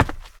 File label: wood step; Golden Crayon Sounds